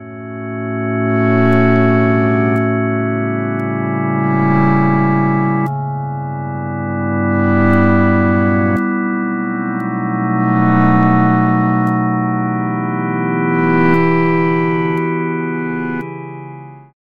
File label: binaural pad organ